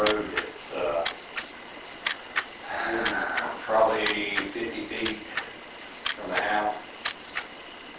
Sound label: Unexplained loud breath; Case 032 Wampler Home